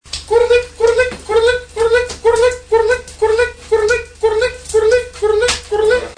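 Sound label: funny sounds; zvuki prikolnye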